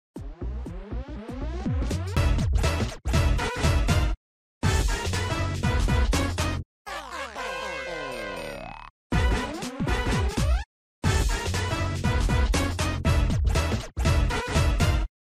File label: Jingles; 18-Volt; WarioWare Gold